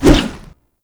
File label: Shield Swing; Grineer